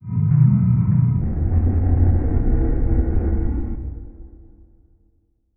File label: Transition Stab Dark Ghostly; Production Element